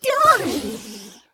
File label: WATER FISH